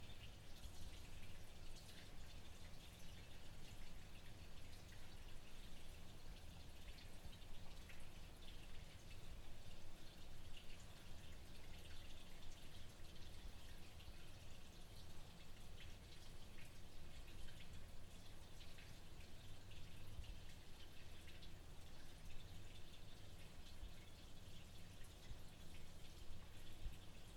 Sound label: Water Basement